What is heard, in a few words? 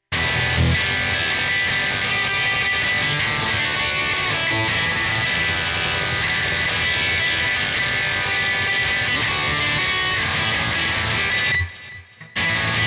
electric